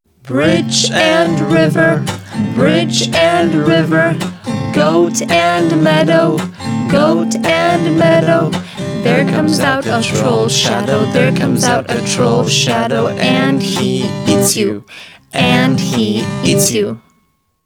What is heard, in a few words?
bridge and river